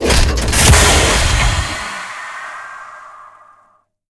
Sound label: mechanism designed mega steam lever; sonniss gdc